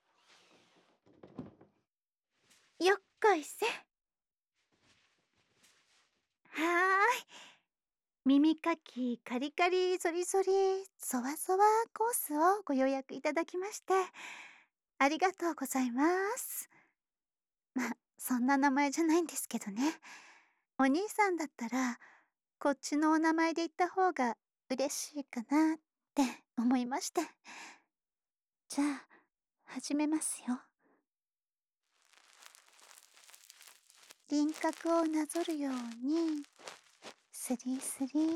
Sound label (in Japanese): 耳かき （右側） コース